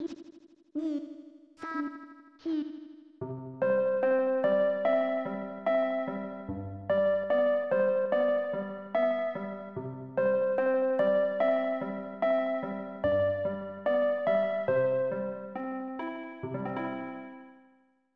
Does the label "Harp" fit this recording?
Yes